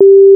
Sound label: button, oven; prime oven